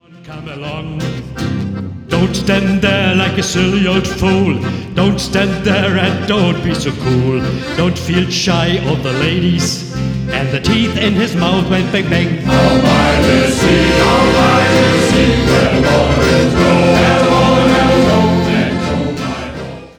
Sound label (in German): Shanty Chor